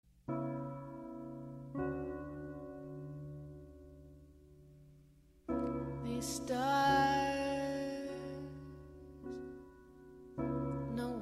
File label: Deep Space, massage californien